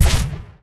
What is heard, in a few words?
Launcher Shot; Awakened Light